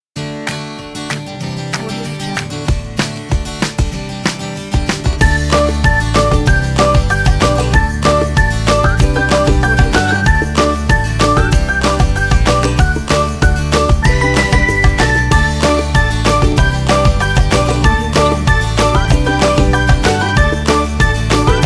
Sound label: fun upbeat logo intro